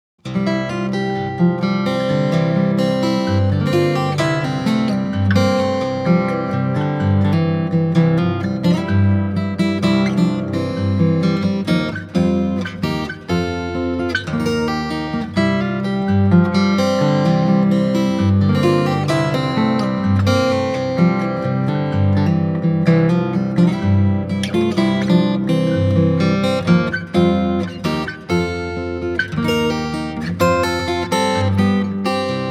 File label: steel pick